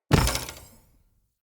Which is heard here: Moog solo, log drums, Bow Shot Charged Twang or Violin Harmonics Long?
Bow Shot Charged Twang